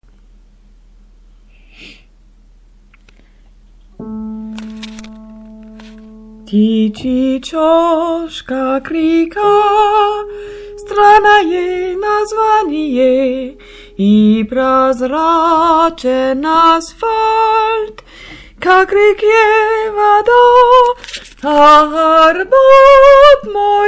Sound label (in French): soprane